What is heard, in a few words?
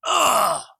Scream Ugh